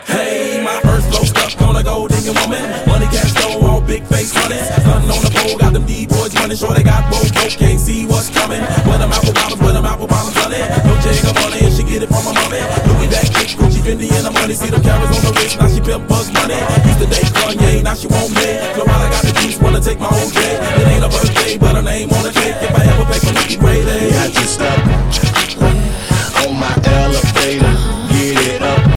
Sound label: elevator ringtone